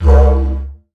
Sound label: Didgeridoo Kit Samples